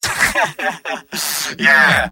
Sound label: scout positive vocalization; tf2; mvm